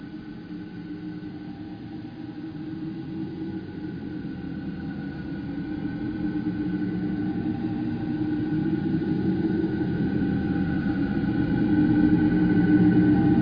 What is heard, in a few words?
Menu theme